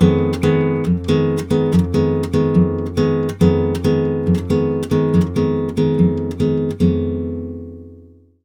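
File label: 140 guitar F diminished, brazil bossa; USB Soundscan; AKAI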